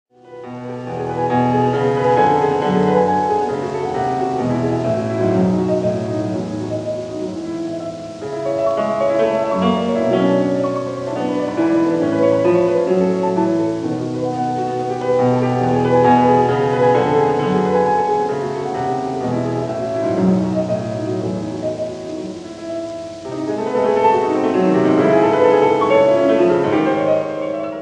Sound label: Symphonic Etudes for piano Vivace; Schumann; Op. 13